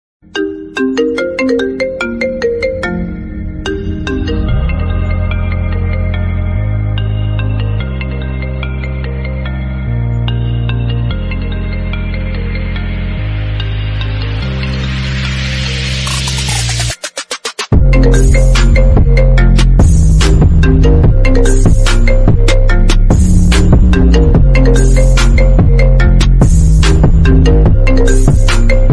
Iphone 13 Pro Ringtone; Apple

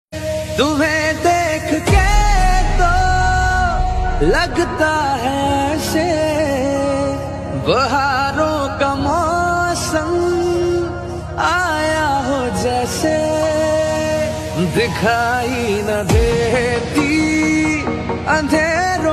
Old Hindi Song Ringtone

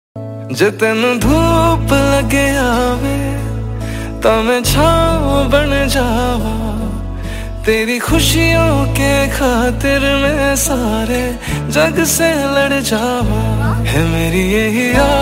Hindi Ringtone

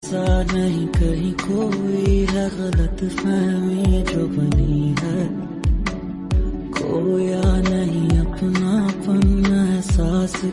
Hindi Love Song

